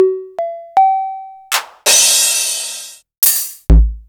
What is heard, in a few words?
Korg Electribe ER-1 Kit; Sliced